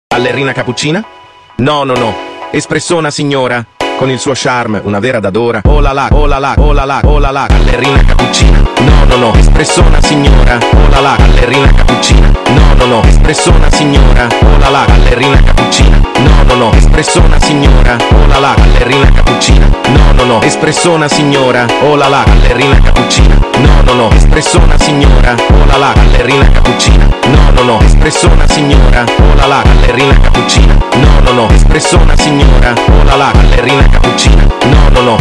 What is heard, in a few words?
phonk music